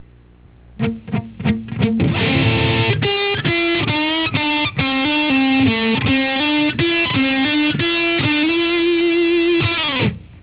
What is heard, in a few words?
electric guitar